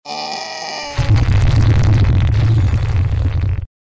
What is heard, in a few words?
Extreme meme fart blast; bass boosted